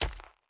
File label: Enemy Clicker Run